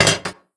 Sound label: weapon drop